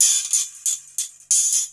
percussion loops, 138, Techno

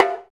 Bongo, Roland BK-5; Percussion

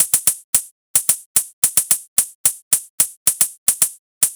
NRG Cymbal; Drums; Cymbals; Trance; Sonic Foundry (Sony Creative Software); Trance NRG VOL-1

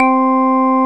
CHUNK ORGAN; Zero-G; Funky Elements

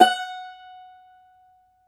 cumbus saz F#, plucked strings; E-MU Producer Series Vol. 7 – Old World Instruments

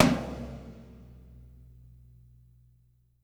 TOM 2P R, ROCKER KIT

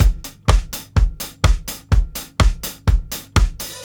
125 4 FLOOR X STICK, DRUMS LOOPS; BASEMENT DRUMS VOL 2

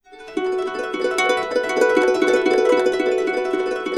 Complete Harps, F#; USB Soundscan vol.62; AKAI

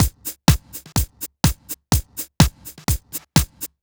Hat Party, Drum Loops, 125 BPM; Sonic Foundry (Sony Creative Software); Underground UK House & Electro

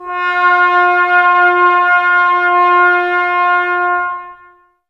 brass, Tbs Mute; Miroslav Vitous - Woodwind Brass Ensemble

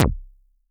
K Juicy, Vermona Mono15 Drums Kicks

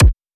Basement Dwellers Kick

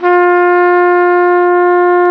Flugelhorn, Solo Brass

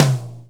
DRUMKIT T; Keith Emerson Signature Series

